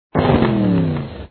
v8 stop; MaseratiGranturismo; Racing Games

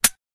dry fire; MafiaAgent; Action Games

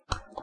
hit, Cricket; SuperCricket

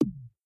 shot, football; Kids Games; DribbleKingsFootball

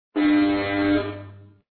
foul, Basketball; Sports Games; BasketballPractice

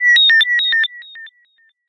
gameover; HungryNumber; Fun games

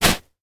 sand; FootgolfEvolution

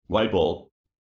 wide ball, Cricket; SuperCricket

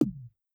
shot, football; Kids Games; DribbleKingsFootball

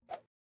move; DD21Blitz

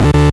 error; MaseratiGranturismo; Racing Games

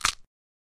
smash; MafiaAgent; Action Games

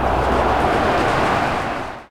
ball near missed, football; Kids Games; DribbleKingsFootball

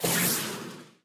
misile sound; BoatRush; Racing Games

